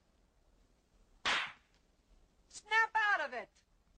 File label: snap